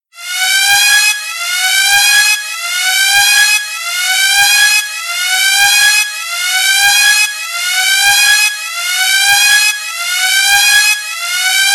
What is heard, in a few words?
Spaceship Emergency Alarm Meltdown Car Horn